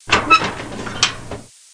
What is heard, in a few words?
File Cab; Florida State Football - Ultimate Multimedia Experience